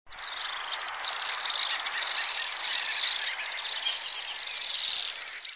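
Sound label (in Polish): jerzyk mały - Apus affinis, głosy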